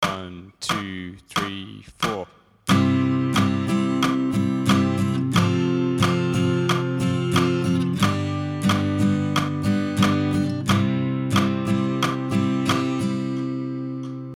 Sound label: capo chords capo 3, guitar